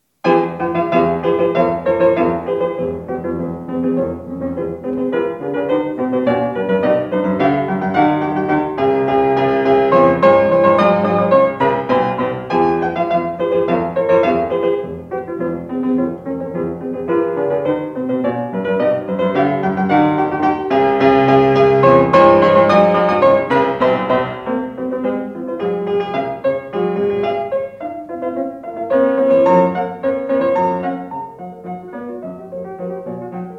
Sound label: all viv; schubert; moments musicaux op94 d780